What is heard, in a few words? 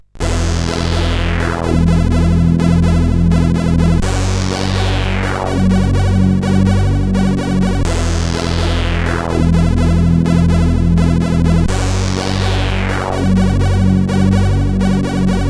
sid CSG8580R5; Yars Revenge 3